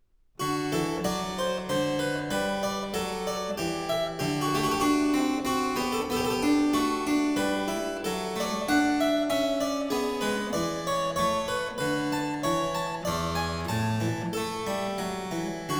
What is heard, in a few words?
French Suite, for keyboard in E flat major Menuet; BWV 815 (BC L22); Bach; The French Suites